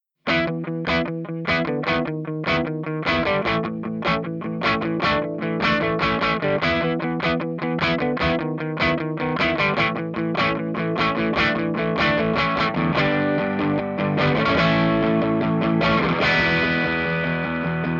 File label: greenback crunch